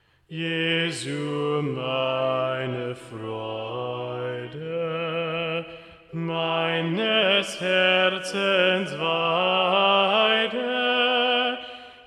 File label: Jesu meine Freude Movement 1 Bass Predominant; Bach